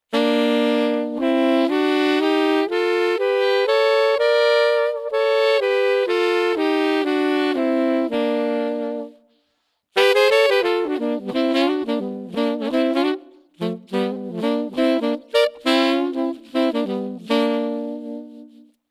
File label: Major Thirds; Bb Major scale with Pitch Shifter set to Major 3rd Interval - Saxophone; Pitch Manipulation Pedals